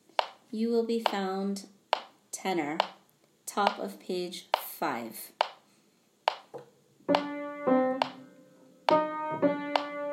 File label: Tenor; Broadway Shabbat